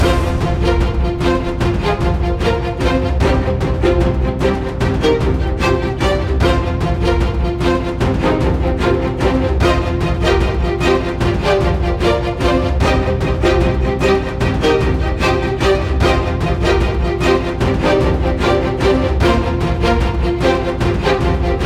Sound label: Bosses Loop; King Lucas